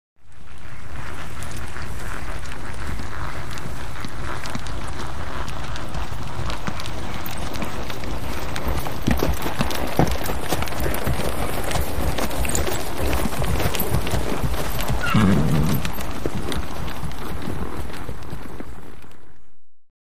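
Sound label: Cavalry Movement, horse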